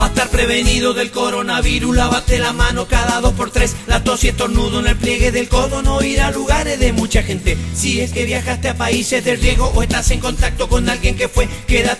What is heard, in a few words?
coronavirus chamame